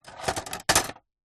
plastic shards broken thin pieces set down; foley

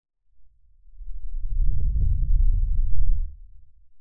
Whoosh Rumble; bottlerocket

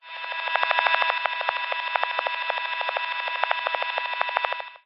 technology cb radio interference; zapsplat

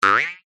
cartoon jump jaw harp

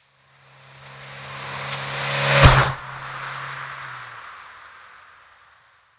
Car Fiesta Pass By Wet; noise creations

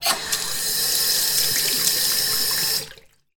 household razor disposable rinse under tap water; sound effects one

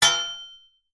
impacts metal hollow frame hit sharp strike chime

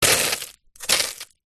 foley metal hot water drips into sizzle; zapsplat

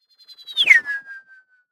science fiction ufo pass fast cartoon like; zapsplat

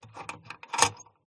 Drawer, Handle, Jingle, Creak, Metal; PMSFX

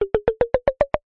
cartoon ascend knocks wood delicate tip toes

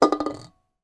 foley can beer empty down table wobble; zapsplat

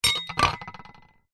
trowel metal set down on tiled ground; industrial

